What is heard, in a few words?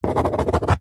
office writing signature pen on paper on cardboard box; zapsplat